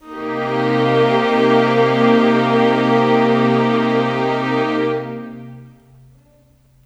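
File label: Pad C minor 7, Orchestral; Sonic Foundry (Sony Creative Software); Orchestral Series VOL-4 - Rock and Pop; Pads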